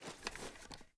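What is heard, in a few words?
reload empty quick movement; gmod; viper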